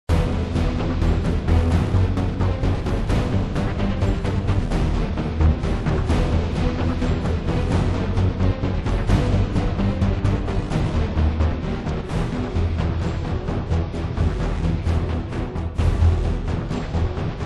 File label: Fight